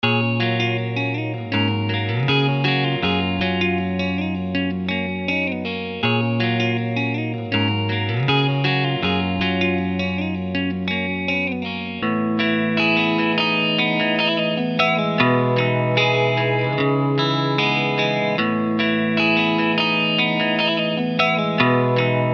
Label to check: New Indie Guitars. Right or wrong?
Right